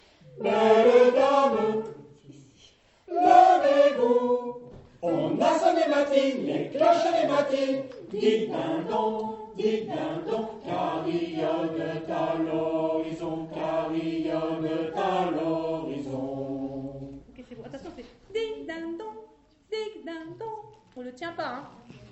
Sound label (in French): Belle Dame Sopranes et tenors; Le Sire de Vergy